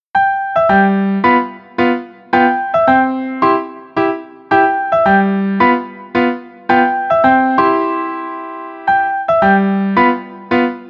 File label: piano piano